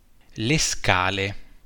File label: scale